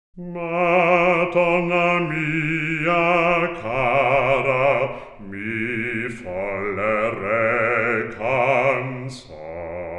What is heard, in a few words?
music